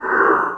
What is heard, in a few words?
moan; The Elder Scrolls III - Morrowind